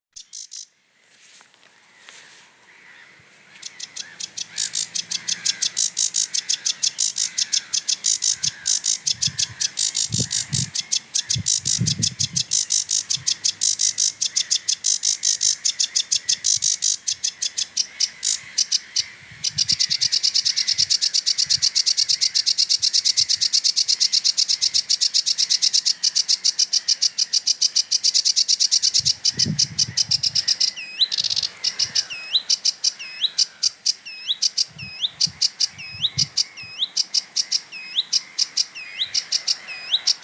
sävsångare; fågelljud

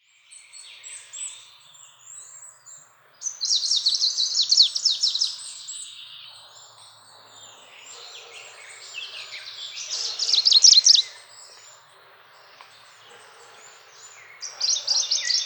lundsangare, fagelljud

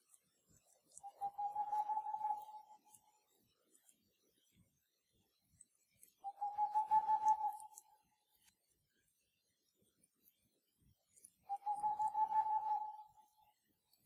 pärluggla, fågelljud

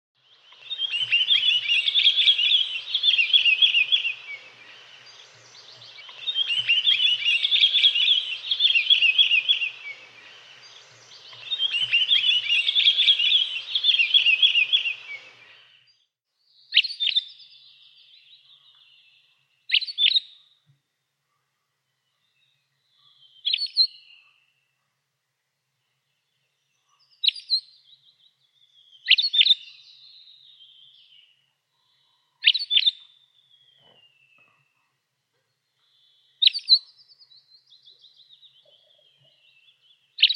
tallbit, fagelljud

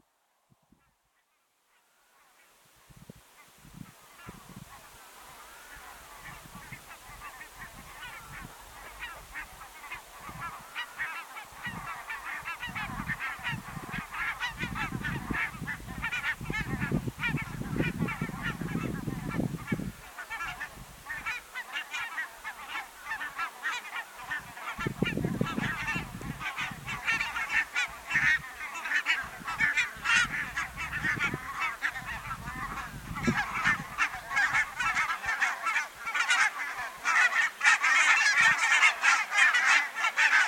vitkindad gas; Djurstad bodar oktober 2022; fågelljud

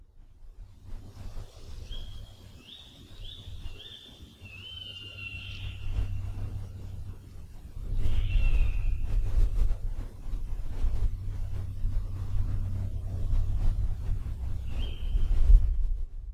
kattuggla lock; fågelljud

